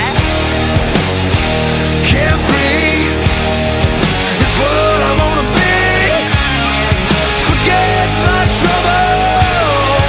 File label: Chorus